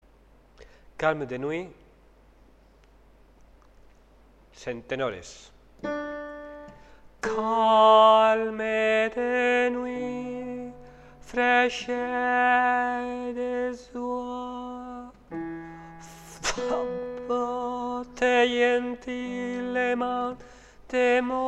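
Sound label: tenor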